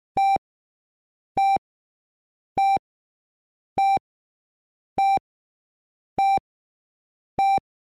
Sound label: Emergency medical kit heart monitor beeps